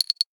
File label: Message